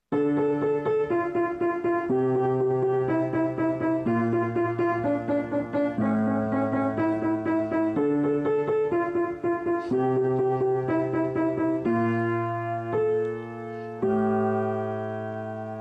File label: Tetris Dramatic